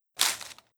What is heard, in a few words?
grass Footsteps; voxelverse